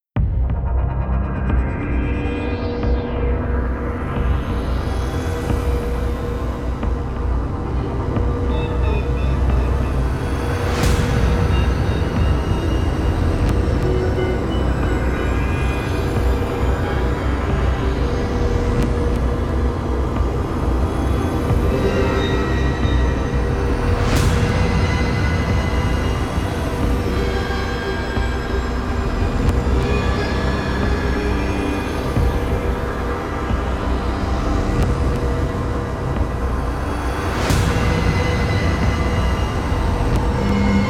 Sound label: A thick background